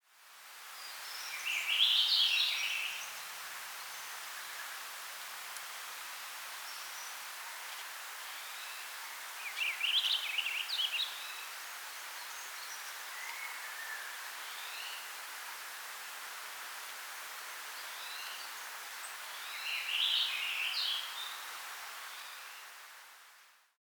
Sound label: Purple Finch